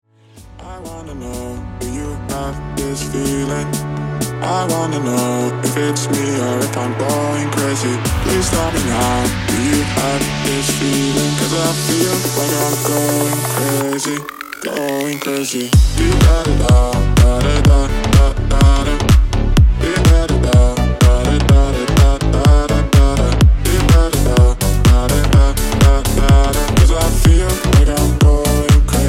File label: Slap House